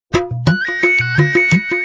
Very Sweet Sms Tone

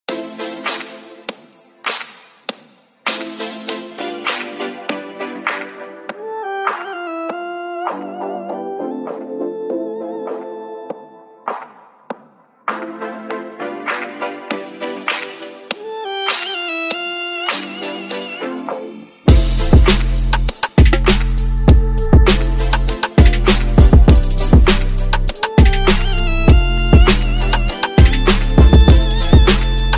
Trap

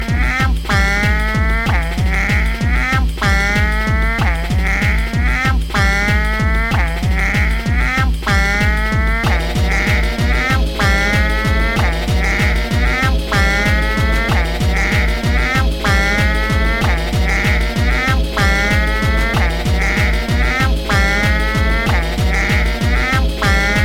Funny Sound

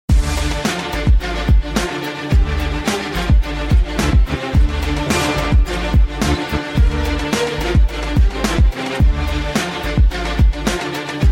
Hip Hop Beat

